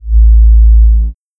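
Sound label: Kick Up N Down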